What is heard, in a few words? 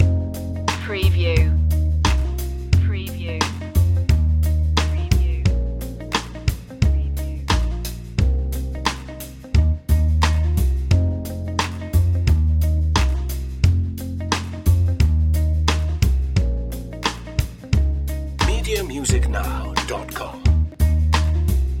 jazzy vibe loop